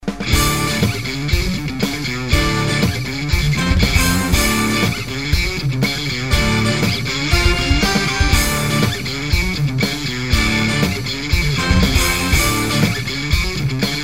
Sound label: riff